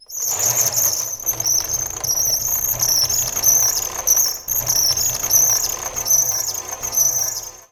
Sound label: Trolley